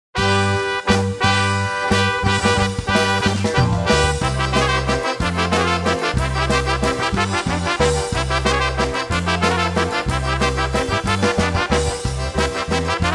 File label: Oberek